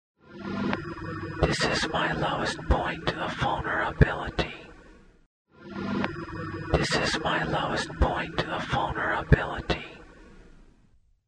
reverse; backmasking contrario

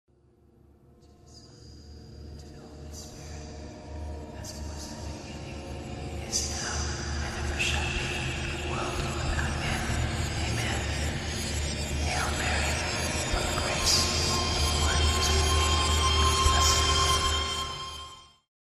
prelude reverse; backmasking contrario

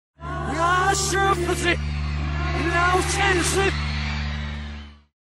reverse; backmasking contrario

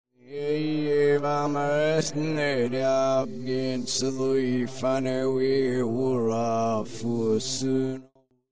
reverse; backmasking contrario

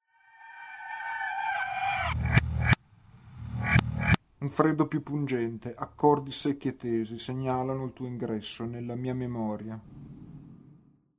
reverse; backmasking contrario

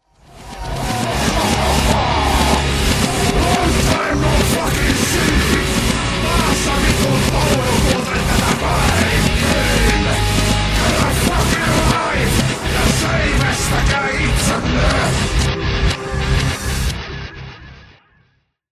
reverse, heavy metal; backmasking contrario

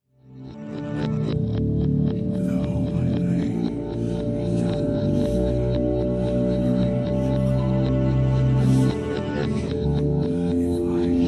creepy greenlight reverse; ghotic metal

